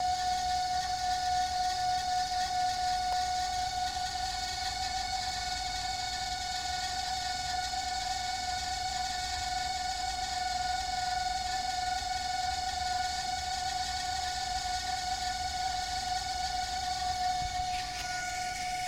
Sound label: room noise